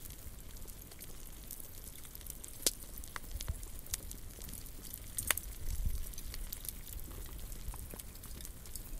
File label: ambience hot stone; everness